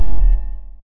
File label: access denied; STALZONE; minecraft